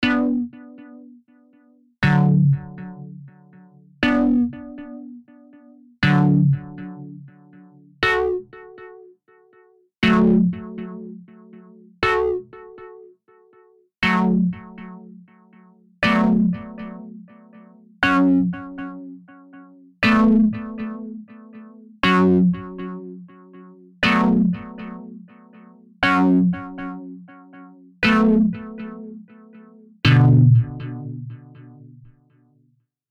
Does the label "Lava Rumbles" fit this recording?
No